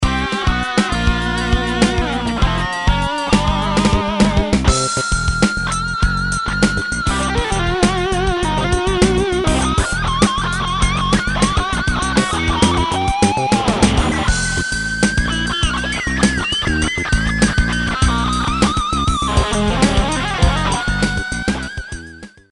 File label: Solo improvisation; assoli e improvvisazioni varie